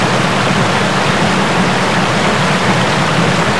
waterfall, outdoors

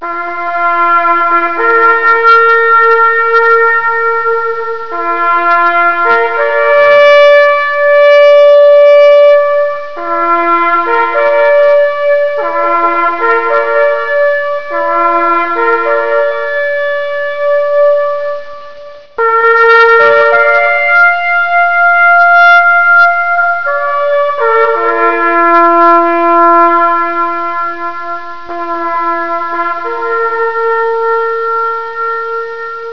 taps; lmctf

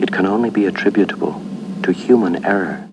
death; hal

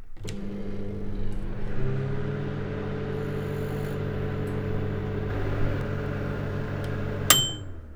microwave; openffa; aggrocult

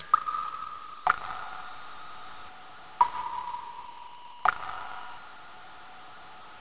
drip; openffa

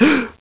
bump

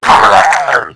death; lmctf; gladiator

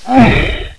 jump

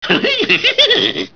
scooby doo laugh; lmctf

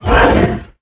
bite, hound; zaero; monsters

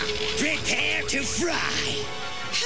pain; vortex; vegeta

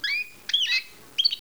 coast bird

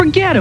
pain; opentdm; cheetor2

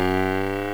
jump; puffin

